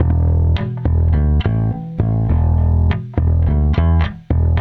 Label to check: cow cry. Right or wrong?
Wrong